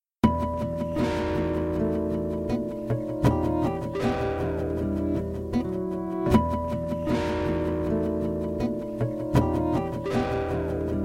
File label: loop